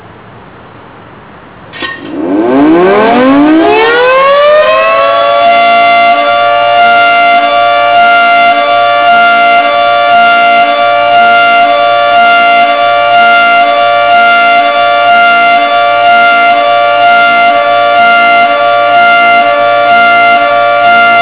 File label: 3T22 Hi Lo, sirens; Federal 3T22 Hi-Low Signal